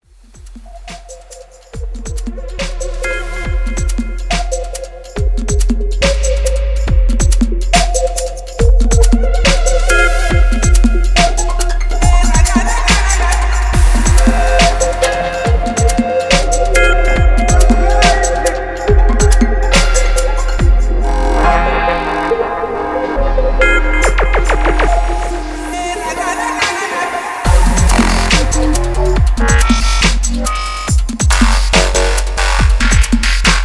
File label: DUBSTEP